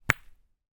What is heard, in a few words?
sport petank boules metal ball land in sand hit another ball